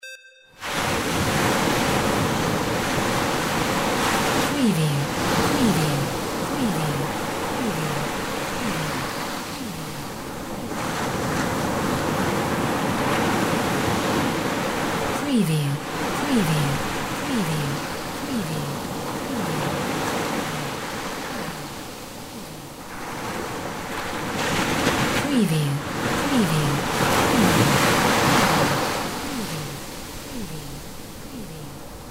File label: seashore waves crash; windy beach audio